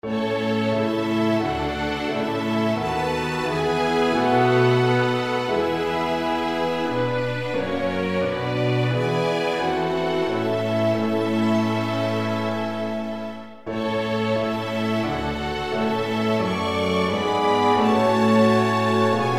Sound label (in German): sinfonieorchester